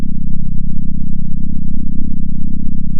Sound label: center, sound of a small motorcycle